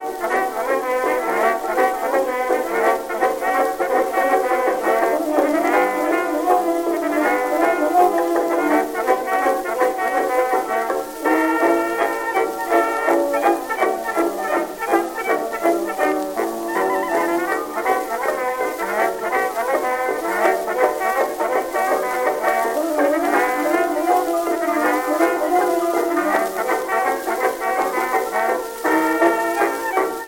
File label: Railroad blues, jukebox